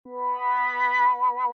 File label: tone